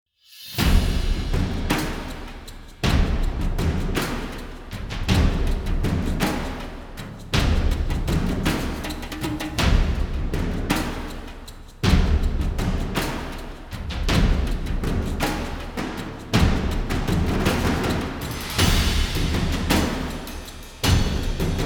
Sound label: Combat Loop Layer; The Witcher 3 Wild Hunt